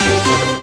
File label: super meter win line; mega joker